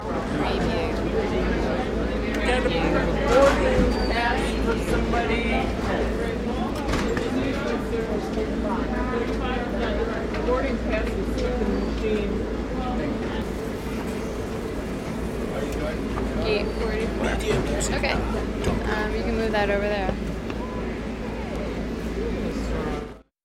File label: Arprt Scrty